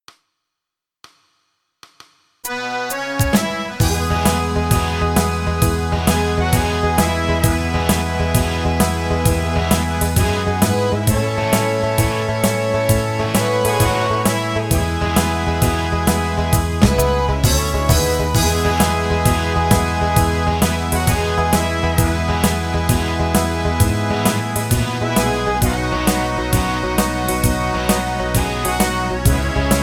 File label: Irish Rock Medley E, backing track